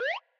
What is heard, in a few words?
jump (without delay); game